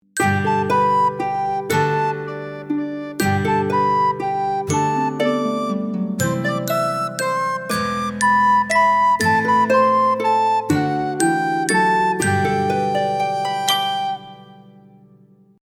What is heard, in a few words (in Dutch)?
Picknick recorder